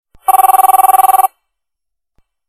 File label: Phone Ring; Matrix